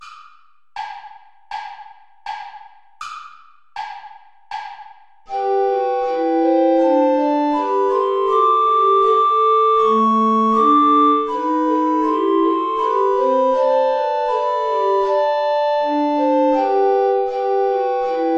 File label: play along without alto recorder; bach johann sebastian; break forth beauteous heavenly light